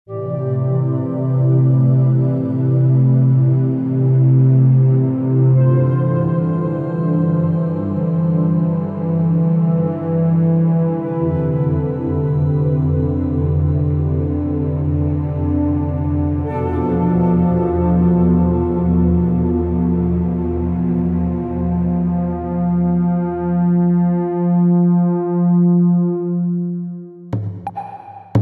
fantasy rpg beat; weeklybeats 2026

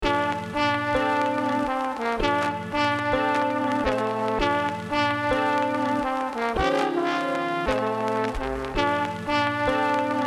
summer jazz

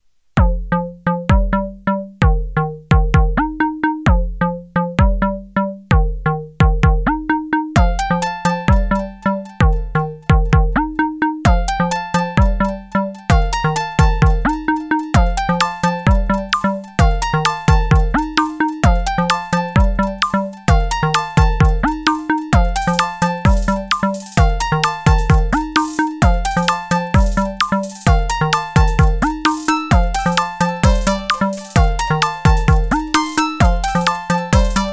tiny tiny sounds tracker perc

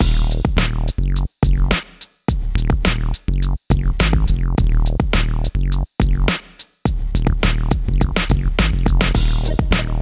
dirty wood beats; weeklybeats 2026

